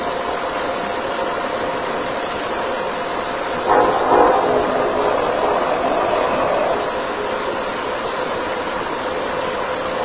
Room with Fireplace Unexplained groan with whispering Amplified; Turner Home; 2nd Floor